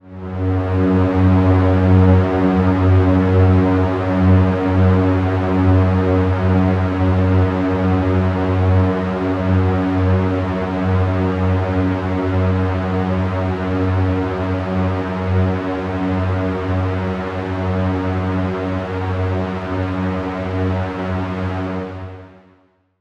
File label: Atmosphere F# 145 BPM, Psytrance; Echos Of Tomorrow